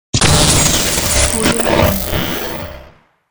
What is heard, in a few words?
shut down